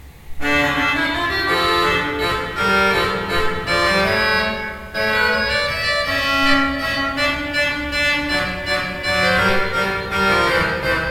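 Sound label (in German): Kleuker 15 II P, pfeifenorgeln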